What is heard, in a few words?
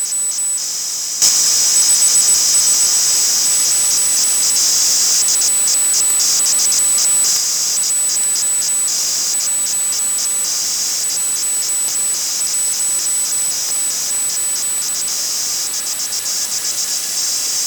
704khz af